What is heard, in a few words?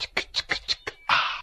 chikka chikka chikka ah, Voice; ooh ahh